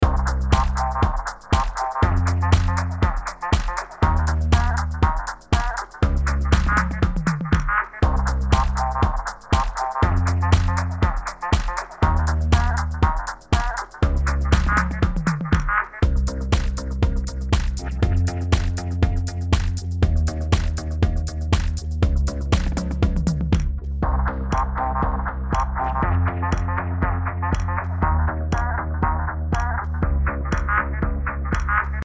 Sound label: LOOP SWING